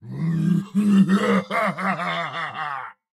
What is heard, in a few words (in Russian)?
Турбосвин Смех Фраза